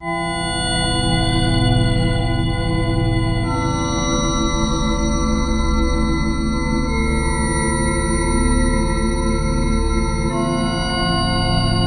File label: atmo graveyard fog